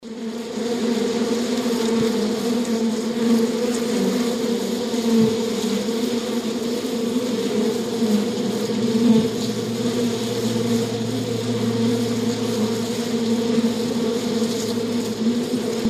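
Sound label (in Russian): zvuk jujaniya pchel v ulee, sound of bees buzzing in a hive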